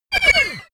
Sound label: sci-fi laser shot